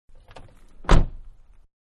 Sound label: Car Small-Door Close-Exterior; Cars (Sound Effects:Vehicles); Manhattan Production Music